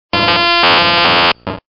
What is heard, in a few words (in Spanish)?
Grito; Electabuzz